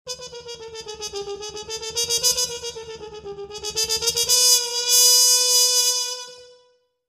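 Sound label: Music Logo Mute Trumpet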